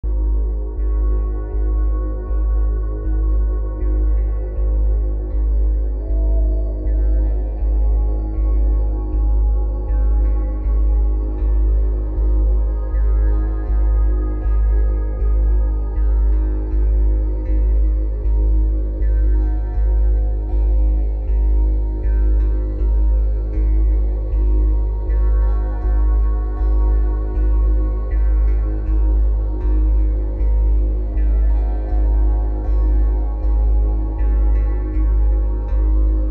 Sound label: Jew Harp